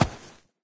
foot, fast zombie; Source Engine; video game; npc

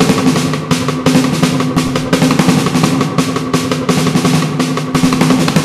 snare horde, music; Left 4 Dead 2; Source Engine; zombat

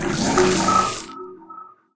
player exit stick paint

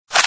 ak47 clip out; Source Engine; cstrike; weapons

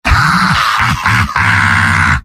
laugh evil; demoman; source engine; tf; mvm

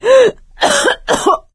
cough, survivor voice; source engine; left 4 dead 2; producer

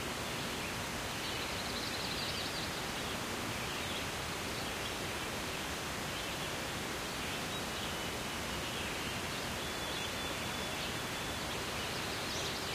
jungle wind loop; Source Engine; tf; lair

